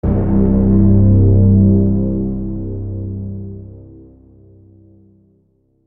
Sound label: trailer sting; Heart Chain Kitty